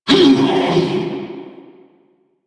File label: Bash Cast Vocal; Warframe; Hoplite